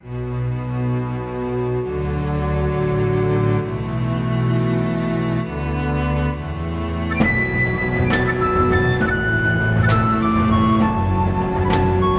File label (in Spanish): musica medieval